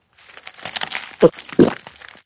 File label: Drink swallow hard